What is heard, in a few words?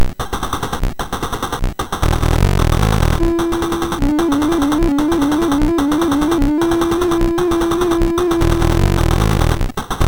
MOS6581R3, GAMES; Tank